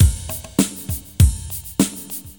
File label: Ballad 100 Variation, Drums; HDR-Studio™ 2.1 Demo; Loops Demo